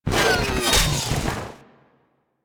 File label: Rocket Close; Warframe; Venus; Venus Turrets; Venus Auto Agent Rocket Turret